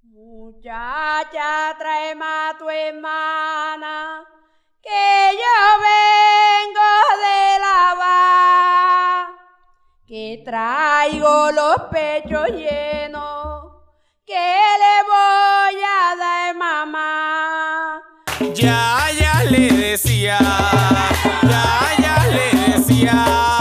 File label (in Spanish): sin vibrato